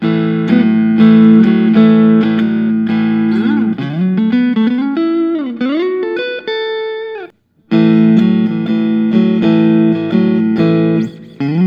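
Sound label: fender custom shop 63 stratocaster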